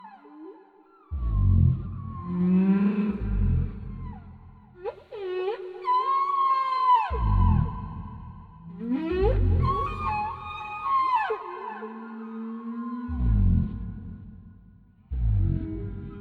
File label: raven call